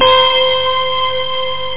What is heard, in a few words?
exec-bell; CU Amiga Super CD-ROM 14